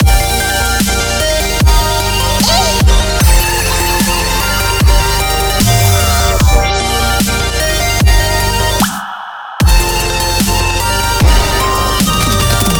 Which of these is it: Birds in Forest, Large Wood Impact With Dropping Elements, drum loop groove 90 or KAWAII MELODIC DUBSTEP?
KAWAII MELODIC DUBSTEP